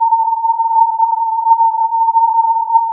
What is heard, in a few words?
filtered input